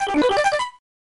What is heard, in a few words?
COIN; Cosmo Gang the Video; arcade